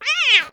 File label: angry cartoon kitty meow; gorbe